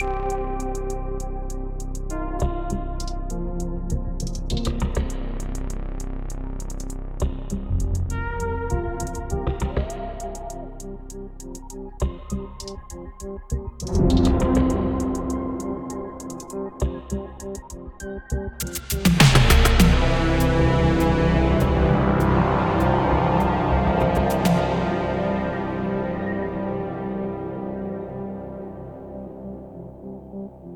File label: Loop 46 Bar 100 Bpm; Protopulse Test 106 3a